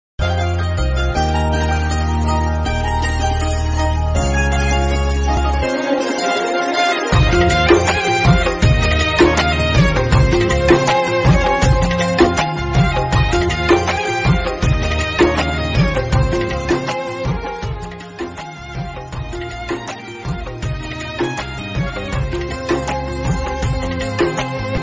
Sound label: instrumental ringtone